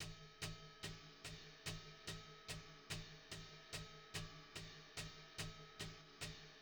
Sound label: jazzy hats